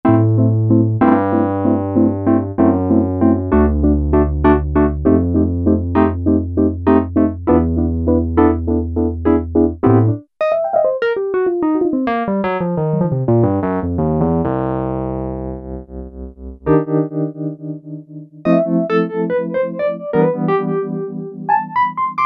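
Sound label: Piano Wurly 200; Roland L-CDX-02 Keyboard Instruments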